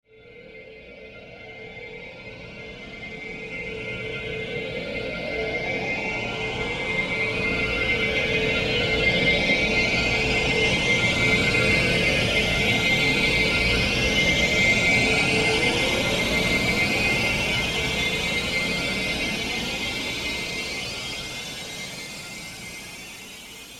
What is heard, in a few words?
Teleportation Device, sci fi; science fiction sound effect